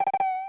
Magic Increased Little; Open Zelda; Quest Designer 2.0.4b

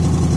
engine low; There; ThereClient

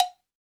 Cowbell Small; One Shots; Drums; Ableton Live 12 Lite; Core Library

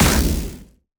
aether hit strong; action; impact

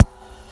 Kick Slappy Noise

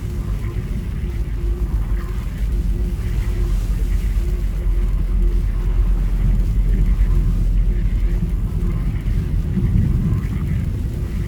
Crimson Ambience Underwater; OuterWildsModManager; OWML; AnonymousStrangerOW.TheStrangerTheyAre

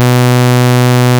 Ob Saw, Synths; Multisamples; Ableton Live 12 Lite; Core Library

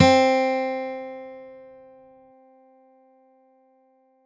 Mallet Guitar C3; Multisamples; Ableton Live 12 Lite; Core Library

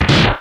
Door Close

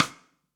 Rim Sidestick Pop Rock; One Shots; Drums

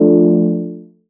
Synth Dmin; One Shots; Instrument

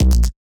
Kick; Ableton Live 12 Lite; Core Library; Drums

